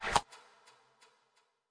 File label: quick Stop; aloha